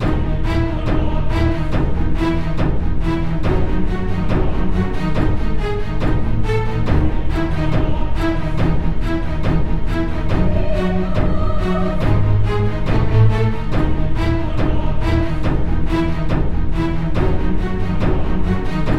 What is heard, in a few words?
BATTLE (MAIN COMBAT LOOP SMALLER)